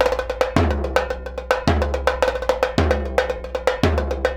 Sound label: 110 BONGO, Percussion Loops; BONGOS